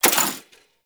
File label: Mech Alt, Rifle; Thanotech; Trumna Prime